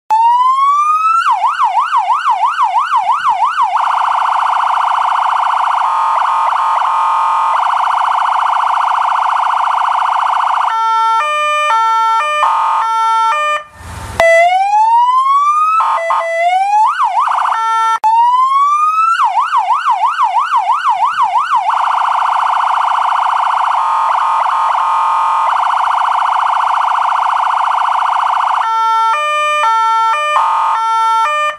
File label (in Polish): Sygnaly Policyjne